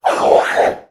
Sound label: Demon; little robot sound factory